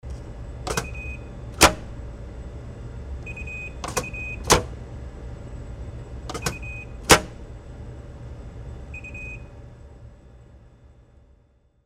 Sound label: food vending machine door